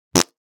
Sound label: Fart Foley - Surprise - Short; Hollywood Edge; sound designer's toolkit v2